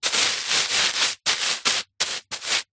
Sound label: household baking grease proof paper rub flatten